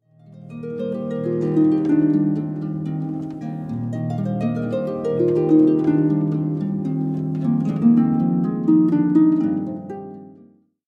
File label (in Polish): harfa